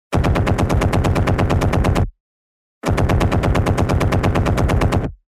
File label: Assault rifle